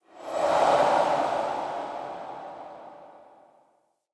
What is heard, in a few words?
Museum Pedestal Light Whoosh; Ambience; Vitruvian Museum